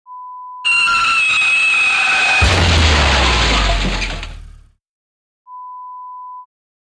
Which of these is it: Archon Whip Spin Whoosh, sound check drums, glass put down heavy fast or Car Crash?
Car Crash